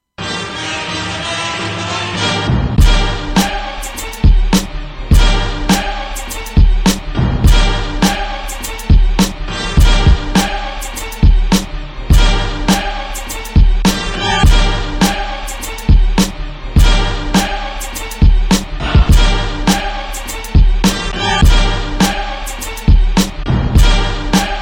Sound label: beat